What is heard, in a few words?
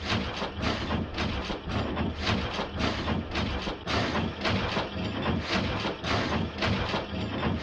assembling machine; factorio 2.0.55